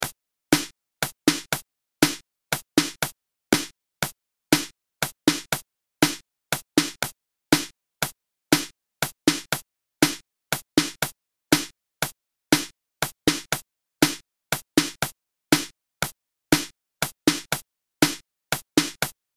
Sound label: hokum shuf, fiddle